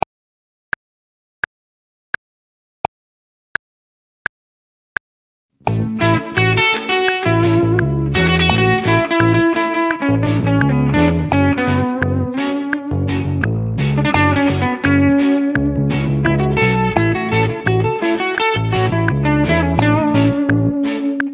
Improv 8 Bar Reggae Rhythm Based

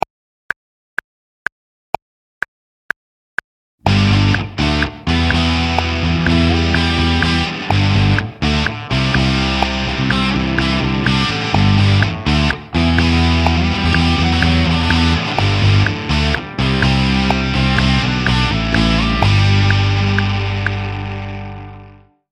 Groove Improvisation Rock 8 Bar

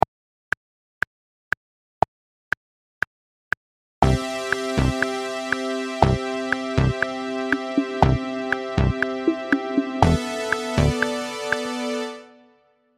Improv 4 Bar Pop Rhythm Based

